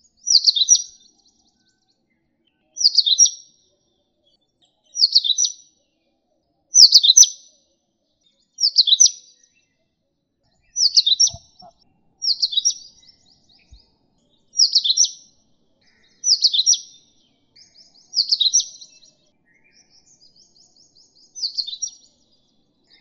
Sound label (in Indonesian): suara burung kacer india